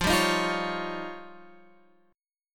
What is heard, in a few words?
F 7th Suspended 2nd Sharp 5th